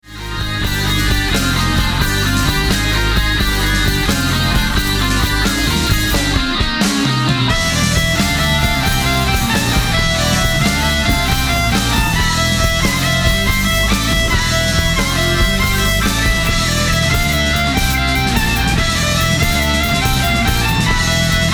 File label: epic